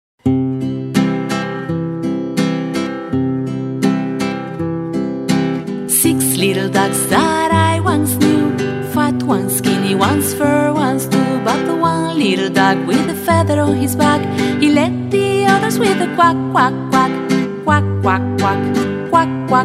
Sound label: 6 little ducks